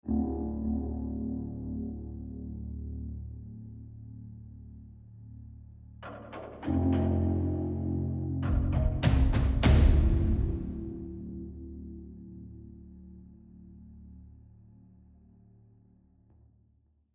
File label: Tension Block